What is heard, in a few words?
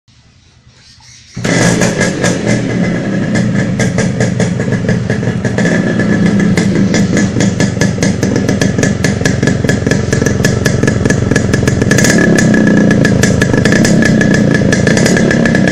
rx 100 sound ringtone